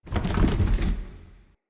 Bookshelf Move Stop A; Movers

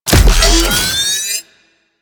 Beam Start; Warframe; Corpus; Corpus Railjack; Admiral Agent

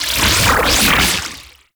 Pirate Cannon Cast; Warframe

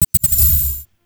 Zariman Corruption Pickup Hostile Large Activate; Warframe; Missions

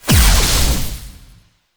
Mech Focus Blast; Vehicles; Abilities; Thano Mech; Warframe; Nechro Mech

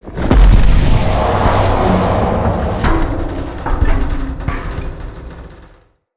Pull Beam Start; Encounters; Collector

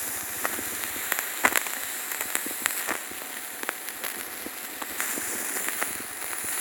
Dojo Incense Container; Warframe; Dojo Props

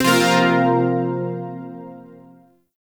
Shawzin Hirajoshi Chord; Warframe; Zariman Shawzin

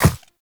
Operator Walk Ice, Footsteps; Warframe

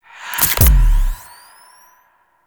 Memory Telepathy Activate; Warframe; Sacrifice

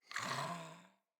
Kubrow Puppy Sleep Idle Snore; Vocals

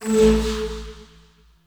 Choir Chorus Aura Damage

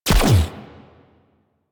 Crewship Turret Fire; Vehicles; Crew Ships; Weapons; Warframe; Rail Jack; Corpus Crew Ship

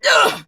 Pain, Battle; Warframe; Dax; DaxRanged; en

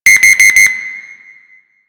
Panel Alarm; Warframe; NineteenNinetyNine; Stage12PartyOfALifetime

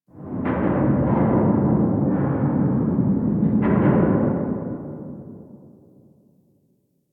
Distant Eerie Metal Bangs; Warframe; Ambience; Nineteen Ninety Nine

